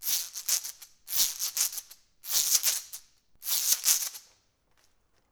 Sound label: Binsasara; Klangerzeuger für den Ritualgesang des japanischen Buddhismus; Instrumentensammlung